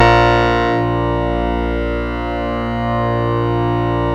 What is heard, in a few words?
A#, PIANO; Big Fish Audio; Synclav FM